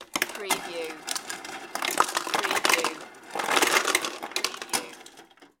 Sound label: Ice Maker Dispense; sound-effects-bible